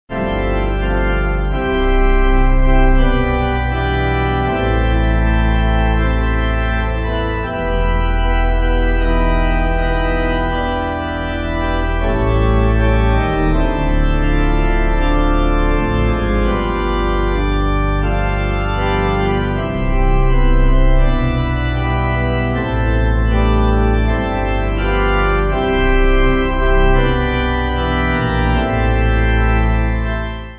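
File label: Pipe Organ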